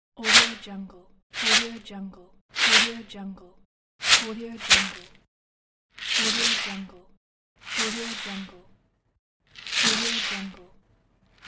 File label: shake grains